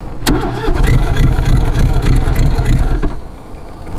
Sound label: boat engine cranking and failing to start